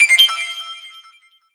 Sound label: Salmon Run SFX - Golden Egg Appeared